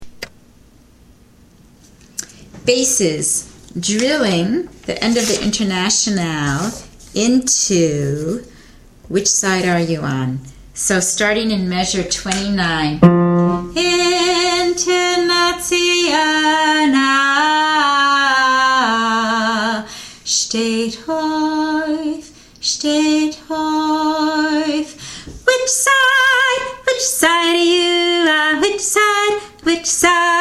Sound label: bass transition